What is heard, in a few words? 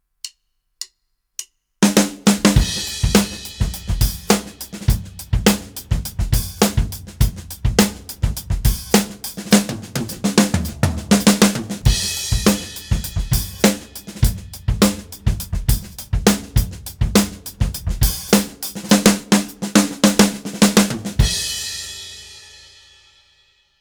Funky Groove and Fill